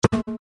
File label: Swap; myxiaoxiaole; CocosCreator